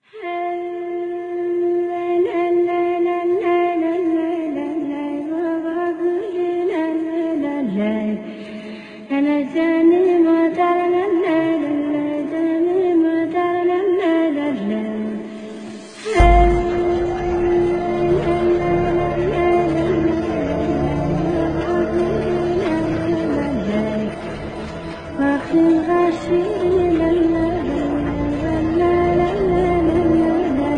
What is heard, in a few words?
Afghan mother's lullaby